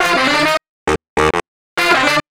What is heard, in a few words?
Horn Sect Effect